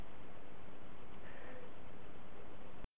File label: Straining Voice